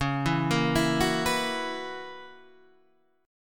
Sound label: B Major 7th Suspended 2nd Suspended 4th Db, chord shape